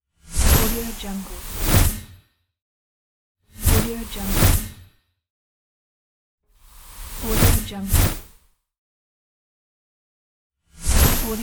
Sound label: magic